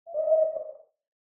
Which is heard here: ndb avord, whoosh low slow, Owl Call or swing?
Owl Call